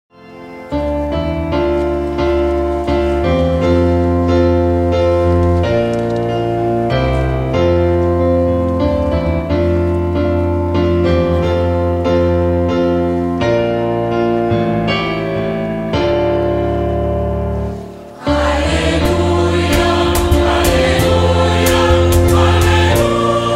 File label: Halleluja